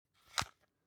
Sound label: object plastic hook fold in; smartsoundfx